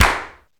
clap, AKAI XE-8; Greytsounds

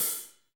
HH, Kit s; Real-Kit s

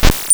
Squeeze And Beep Me; 300 Drum Machines; DevSnd

